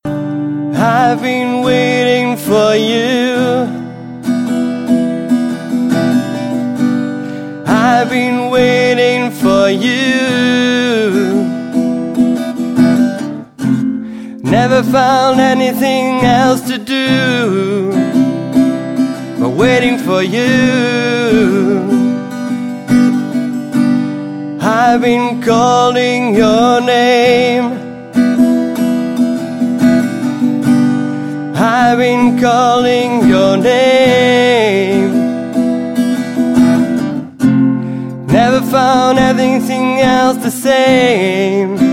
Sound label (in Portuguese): Voz Cantada